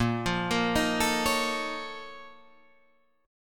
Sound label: A sharp Minor Major 11th, A sharp, down